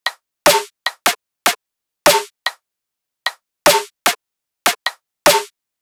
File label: Drum Loop 150 Snare Percs; Loops; Ghosthack - Color Bass Essentials